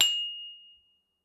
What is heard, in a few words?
ding